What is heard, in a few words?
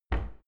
cupboard close normal